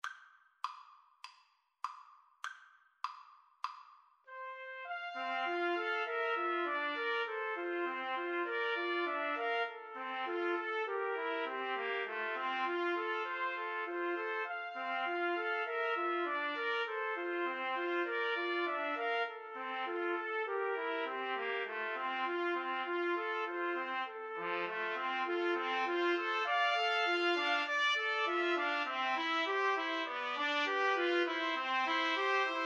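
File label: trumpet, karaoke; Trumpet Trio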